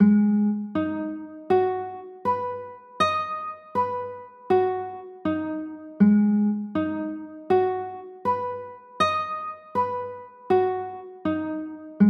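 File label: G sharp m6 chord, guitar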